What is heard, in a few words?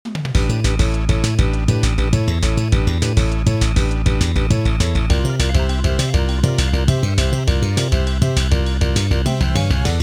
Goal Game; Kirby the Amazing Mirror